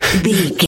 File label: voice male breath loop single